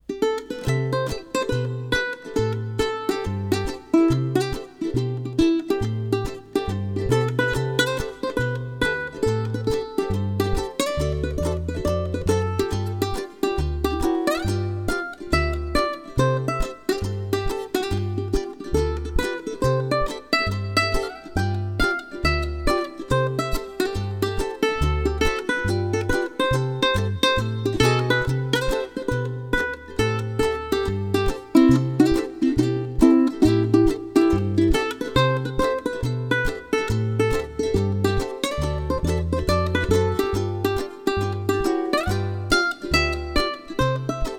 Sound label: Full Band